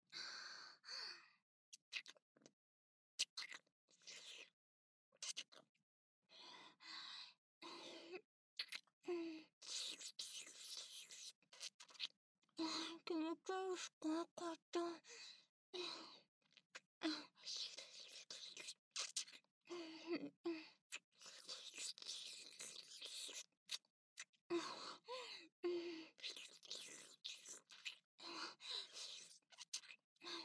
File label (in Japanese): 乳首舐め, ASMR